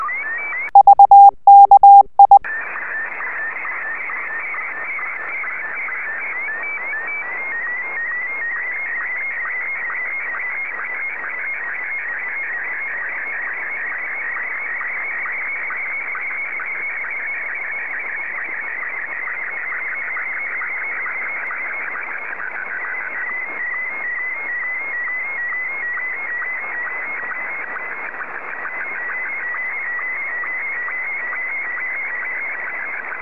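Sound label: SSTV, Martin 1; ZL2TJM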